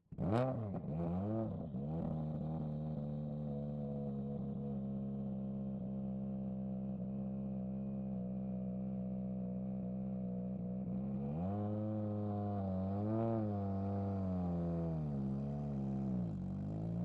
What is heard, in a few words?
Ford Puma WRC - ONBRD - FAST - Start Drive Stop Reverse Stop Drive Launch Control; EXHAUST; Ford Puma Hybrid Rally1 2022; Pole Position